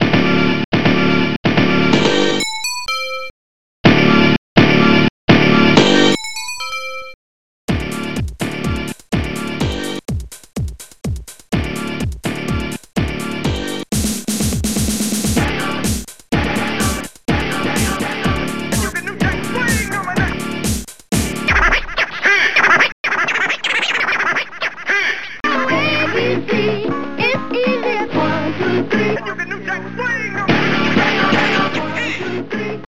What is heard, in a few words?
funk jam; Digital Symphony Collection